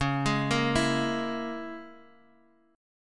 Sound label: C sharp Minor 6th, Guitar, Drop D; x,4,6,3,5,x